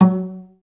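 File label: cellos pizzicato F#2; Best Service; Peter Siedlaczek's Advanced Orchestra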